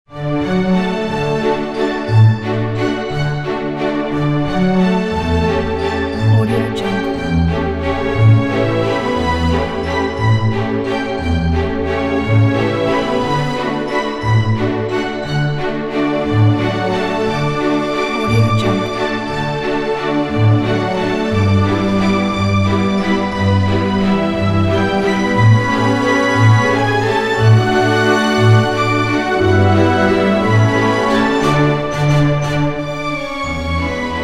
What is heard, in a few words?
waltz; the beautiful blue danube